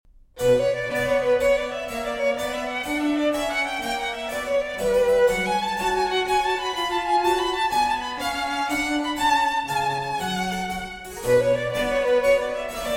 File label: Sonata in B minor for Violin and Continuo